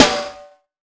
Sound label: High Hard One, Snares; Drums